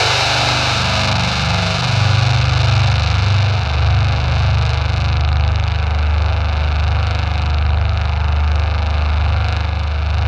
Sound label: ZWEEP; Spectrasonic Distorted Reality 2